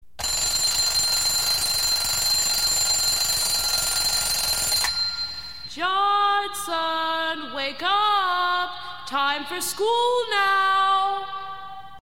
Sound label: alarm and mom